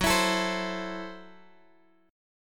F sharp Minor 7th Flat 5th F sharp Guitar DADGAD

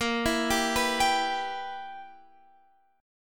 B Major 7th Suspended 4th Sharp 5th, chord

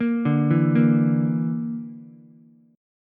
Bb Major Flat 5th Bb down Ukulele, chord; arpeggiated; JGuitar

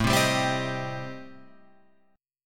A-Minor 7th Flat 5th, Guitar Open F, chord; strummed; JGuitar

